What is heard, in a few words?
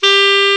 KLARINET, Alpine Volksmusik; Best Service